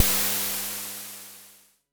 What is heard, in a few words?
FX; MPC DSM-2